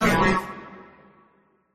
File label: cry